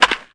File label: bat hit